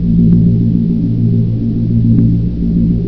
lab drone, ambience

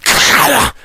rage at victim, infected, male

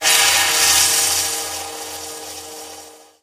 lightbridge elec, footsteps

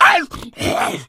hunter pounce miss, voice

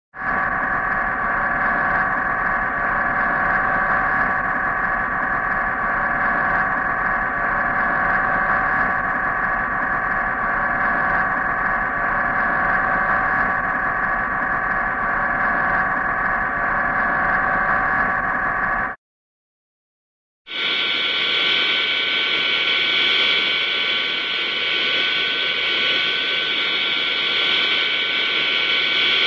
sci fi flying saucer low speed; cartoon

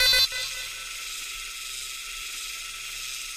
sentry scan, weapons; tf

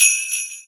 xmas sleigh bells

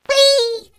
turret launched

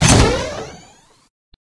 bumper car jump; tf

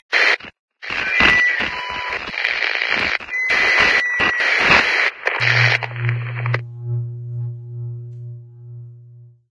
teleconference begin; ambient; source engine; ep2; outland

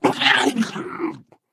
ballbot smash into wall

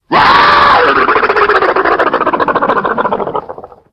spooky, halloween; Source Engine; tf

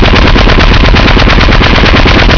gun; Source engine

